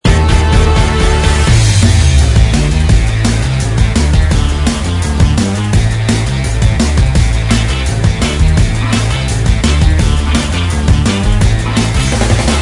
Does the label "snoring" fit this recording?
No